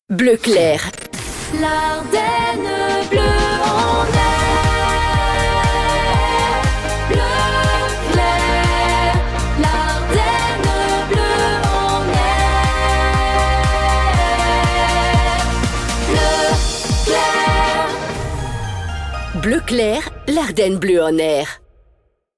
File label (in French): Jingle Chante Theme ID; BleuClair